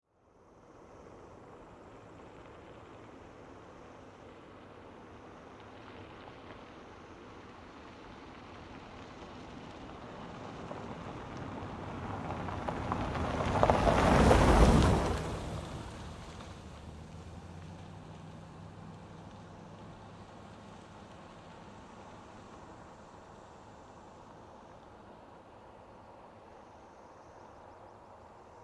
Vehicle Auto Pass By Dirt Road Country Automobile Car